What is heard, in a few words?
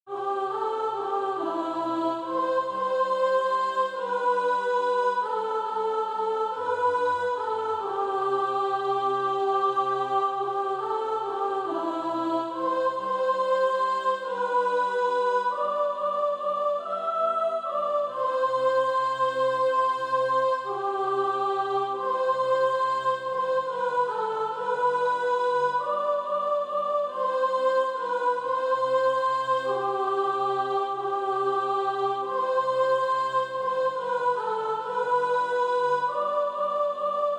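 soprano, Sheet music (SATB) including Practise Music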